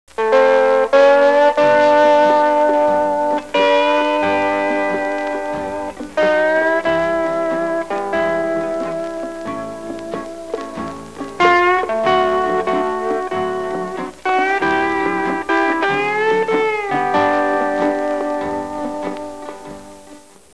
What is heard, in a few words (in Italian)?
suonerie musica hawaiian